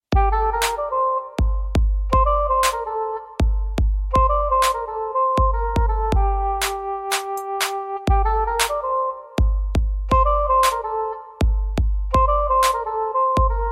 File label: clarinet beat